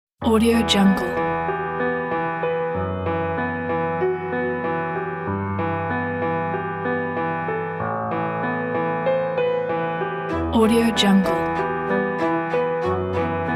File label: emotional dramatic cinematic trailer